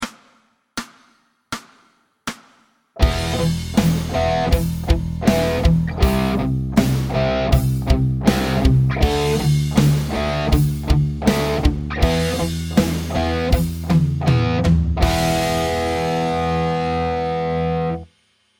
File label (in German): Rock Riff Hörbeispiel